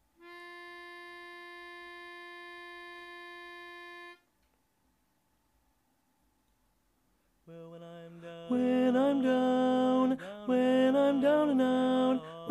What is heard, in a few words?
TENOR; Pennsylvania Groups